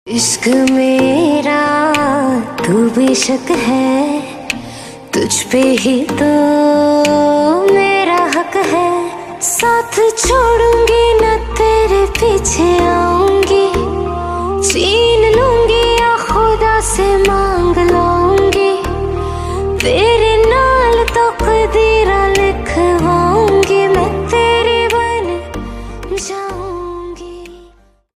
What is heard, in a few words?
female version ringtone